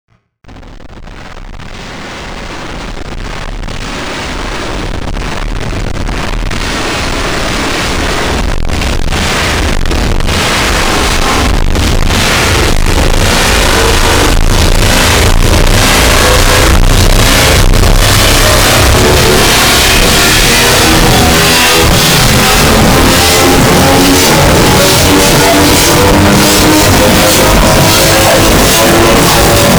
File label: Power Electronics Tribute; lolicore